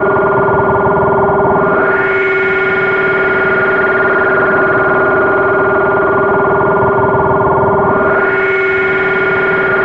SF DRONE, NASTY RM FX; Zero-G Ambient 1

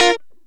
Lounge Guitar Chick Major A#2; Zero-G Kocktail Kollection

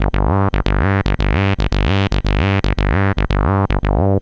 C64 Bass, Commodore 64, Chiptune

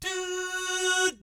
DOO, Vocal Planet Jazz; ILIO; Jazz & FX; JAZZCOMBO

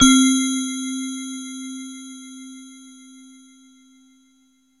PRET BELL, JV-1080; Syntec; Wall of Sounds

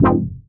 stab norse, stabs

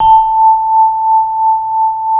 MLT VIBE

